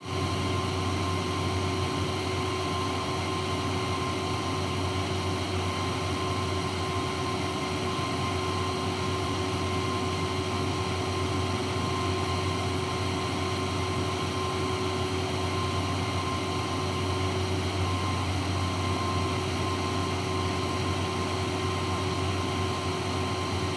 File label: ship engines; World Of Warships